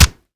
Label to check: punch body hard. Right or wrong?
Right